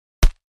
Body Punch

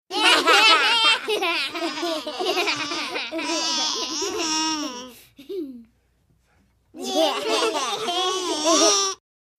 5 Yr Old Giggles

